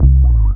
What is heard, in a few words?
Single Acoustic Basses